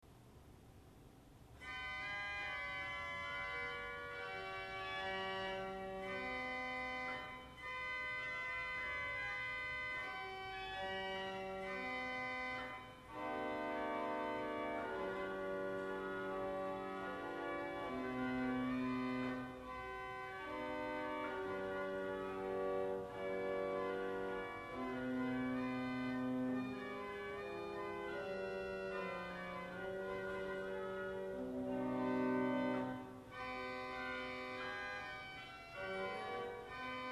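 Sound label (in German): Fugara 4, Orgelstimmen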